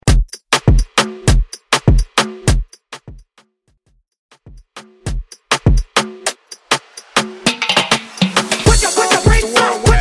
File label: Moombahton; Bootleg; Mainstream VIP